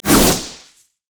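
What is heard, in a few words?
cougar basic attack; nidalee; nidalee base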